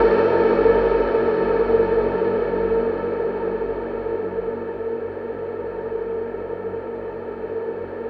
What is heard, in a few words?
WHALE TAIL; Distored Reality